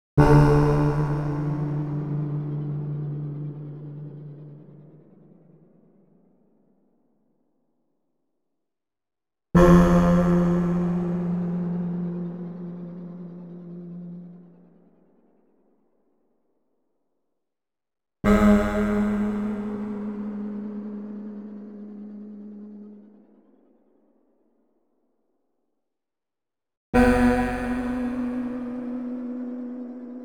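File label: Power Ahh Effect Voice D+3; Choir & Voice; Klaus Schulze Collection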